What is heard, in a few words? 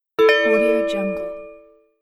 notification; flatcatstudio